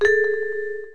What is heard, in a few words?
mail bell new email, sms; ringtones